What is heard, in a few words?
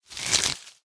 Finger Pops; smite; kumbhakarna